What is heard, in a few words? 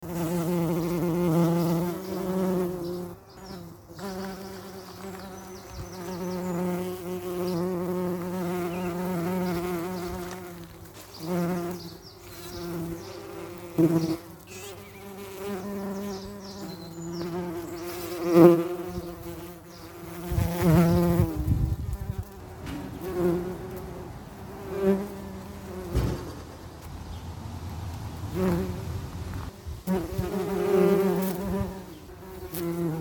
bees buzzing